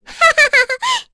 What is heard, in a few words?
Vox Laugh; kingsraid; voices heroes; en